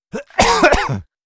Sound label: Male Cough; beanstalk audio